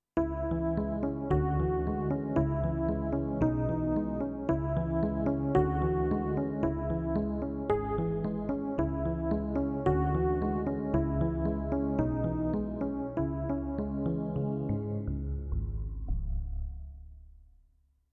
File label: Chorus EP